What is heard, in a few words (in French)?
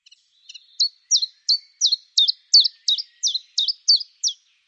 Pouillot veloce chant